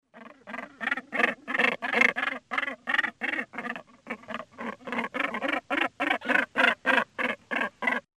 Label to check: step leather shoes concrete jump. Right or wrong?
Wrong